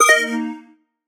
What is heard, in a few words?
pointed, notifications; plasma-mobile